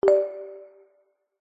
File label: multimedia game sound chime mallet warm family game